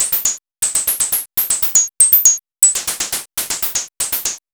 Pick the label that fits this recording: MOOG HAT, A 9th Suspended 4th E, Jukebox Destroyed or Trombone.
MOOG HAT